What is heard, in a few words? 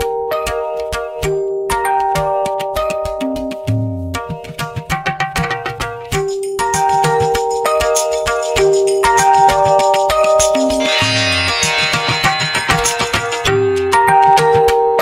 improvised dance Ringtone; Oppo; Reno 2